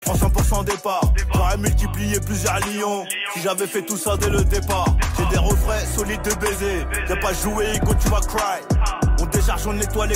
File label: freestyle